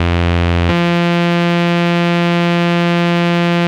logisynth; Loops; Electronic

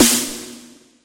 Snares, Real

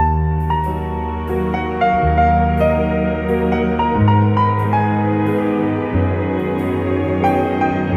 piano bittersweet, Sad Loops

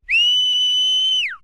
whistle

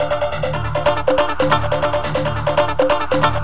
overdrive

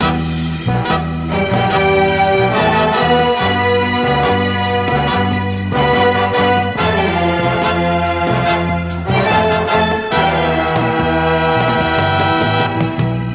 horns, jazz loops

